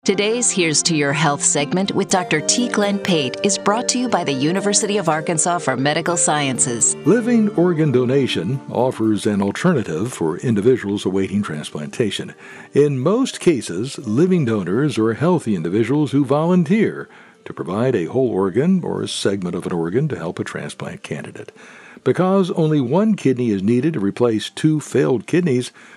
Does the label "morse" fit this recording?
No